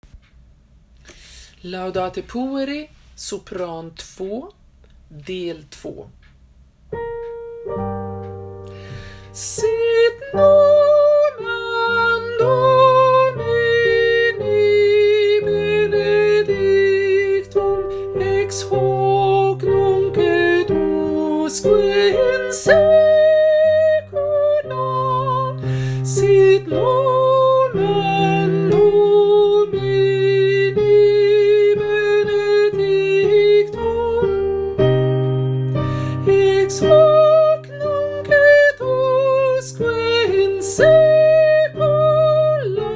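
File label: Laudate Pueri soprano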